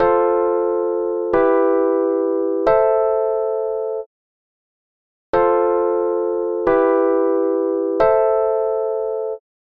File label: Chord Sweet F Em Am